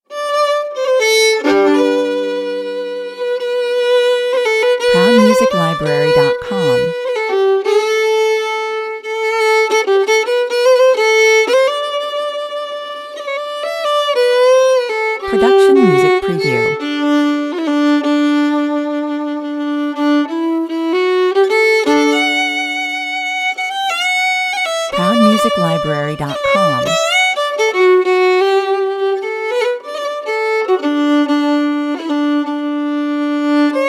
celtic fiddle

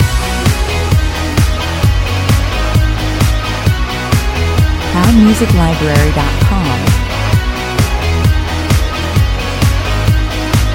neon guitars loop

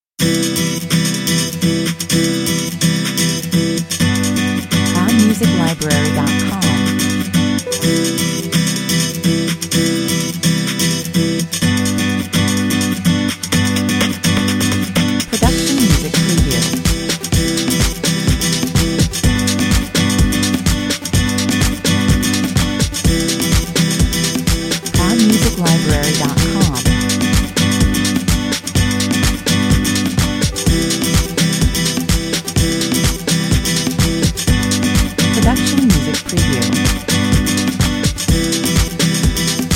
guitar market 126 bpm

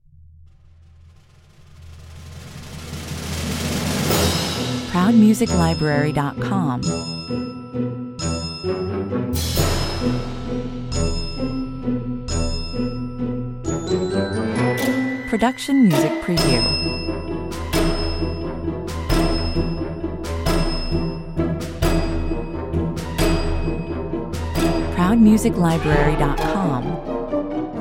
waltz, 132 bpm

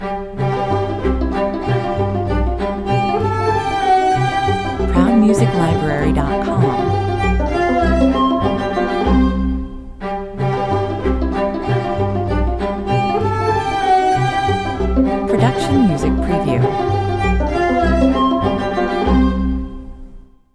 light and lively, playful, 100 bpm; Rosamunde Overture Excerpt; This adaptation of Schubert's Rosamunde Overture evokes a light and lively, playful parlor music atmosphere.

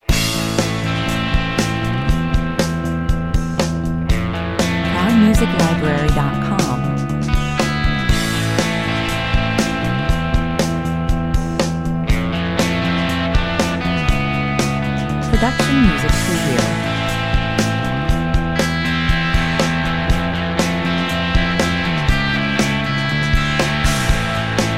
hard rock guitar 120 bpm

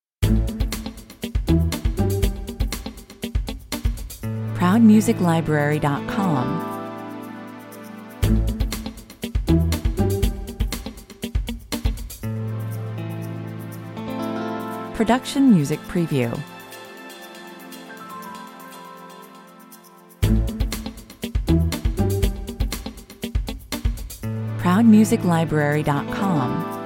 hurry up n slow down 120 bpm

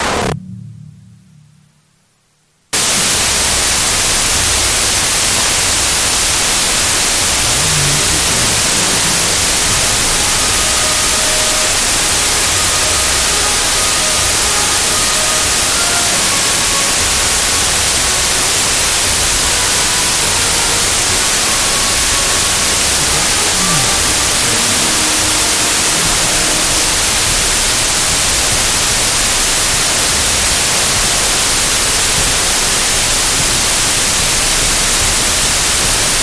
election fanfare 110 bpm

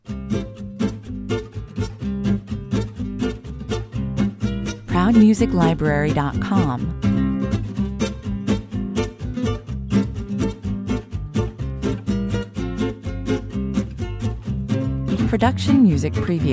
underscore version 125 bpm, Happy, uptempo

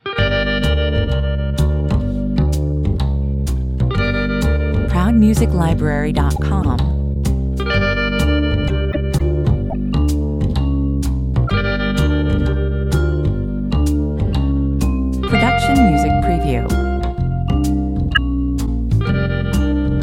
retro spy, no horns

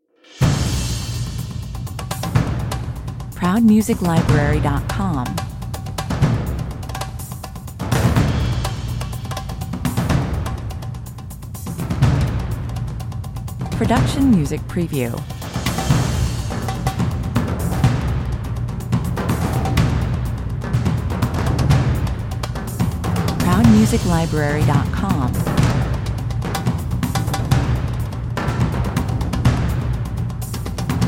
hunting beats 124 bpm

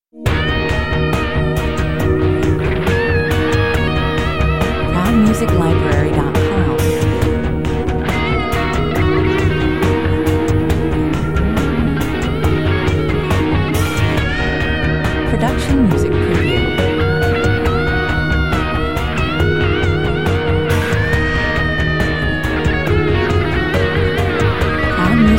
urban grand (electric guitar version)

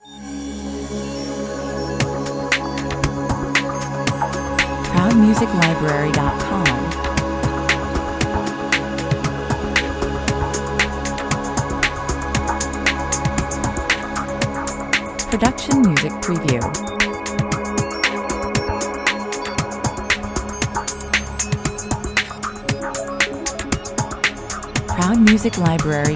technical opener long, 120 bpm

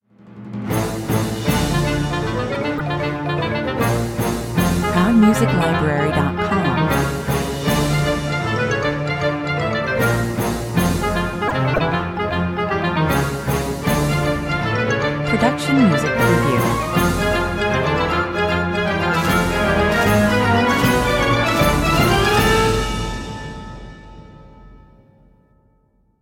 cartoon magic sequence, Dramatic, Suspenseful

